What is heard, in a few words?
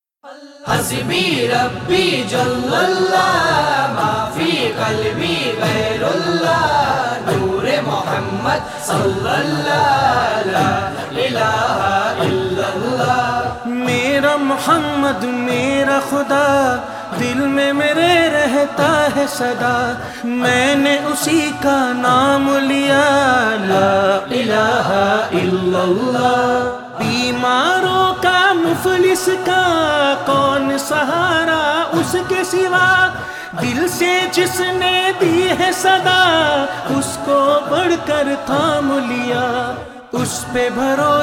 Naat